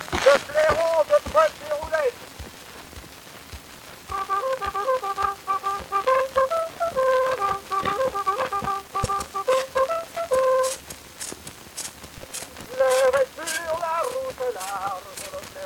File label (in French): Clairon; Deroulede